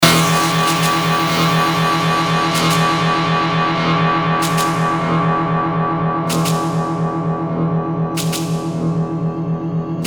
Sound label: Analog Smut